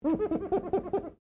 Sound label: GLASS SQUEAK FINGER SEQUENCE; Material components for constructing more complex sounds